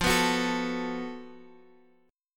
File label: Gb Minor Major 7th Double Flat 5th F